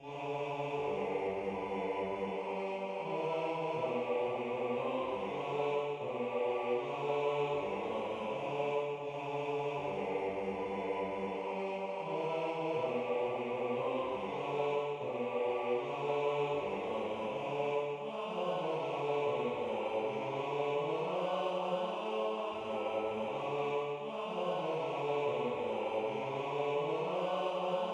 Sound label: Bass; Spazzacamin